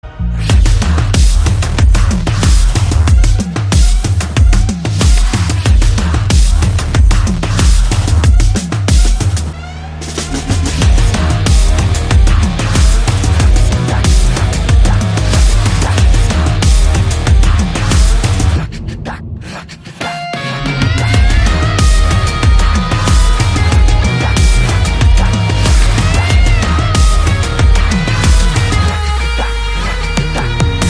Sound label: Tamil BGM